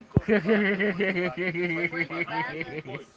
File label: hehe